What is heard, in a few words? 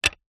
wood spoons x2 drop down on surface